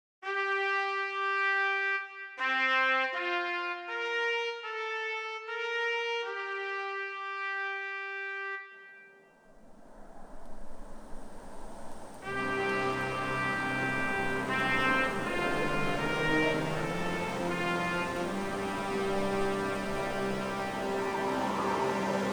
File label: Wind Over Seas